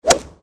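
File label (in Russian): удар с размахом в гольфе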